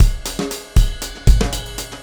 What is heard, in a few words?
Beat, Drums, Eclectic Electronica